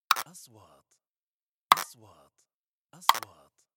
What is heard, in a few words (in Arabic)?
نغمة حركة ميكانيكية; transitions